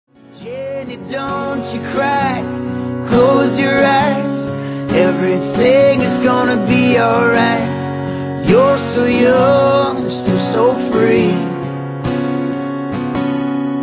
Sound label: acoustic